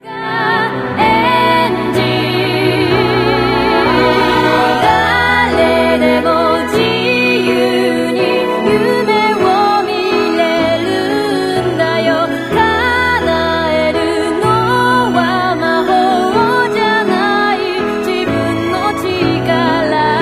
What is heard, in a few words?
(acoustic)